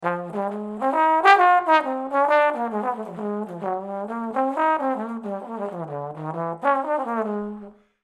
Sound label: Trombone